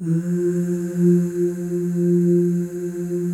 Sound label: OOSC, FEM OOS, Voices